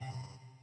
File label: Vocal Oop; Getting Over It; BB 2HTC Samples Vol 4; Weird